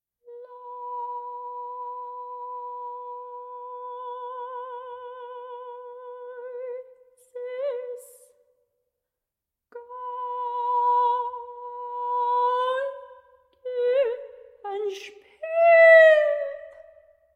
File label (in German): leises geigenspiel